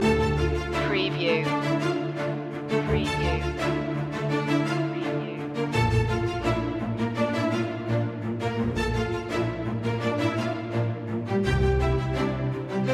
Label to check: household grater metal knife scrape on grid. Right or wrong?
Wrong